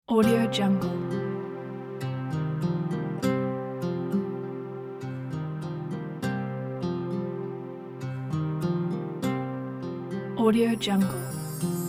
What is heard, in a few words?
ethnic dramatic trailer